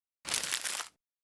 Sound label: Empty Trash Crumpled Paper; Mac